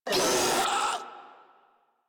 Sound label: Proto Infested Ancient Player Spotted, Vocals; Enemies; Warframe